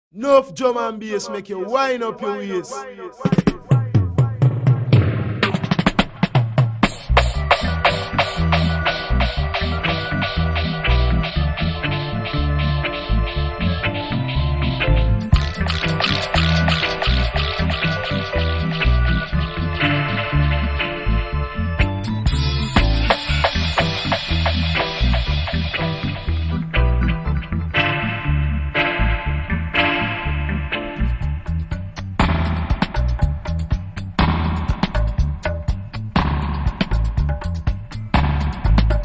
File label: jamaica dub reggae; the sounds you forgot